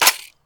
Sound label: Sfx Jammed Weapone; Akxolotl